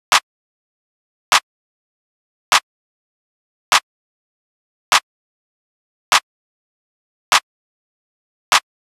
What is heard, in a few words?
Drum Loop, 100 BPM Clap, West Coast; Loop Stems; Cymatics; GHOST (Beta Pack); Flow Drum Loop